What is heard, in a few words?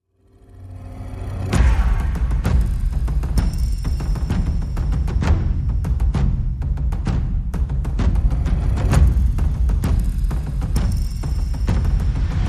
Percussion and Effects